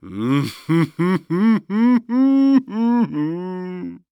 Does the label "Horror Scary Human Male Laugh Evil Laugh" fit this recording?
Yes